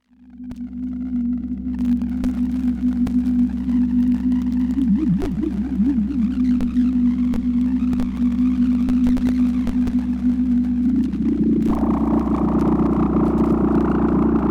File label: 106 glitch processed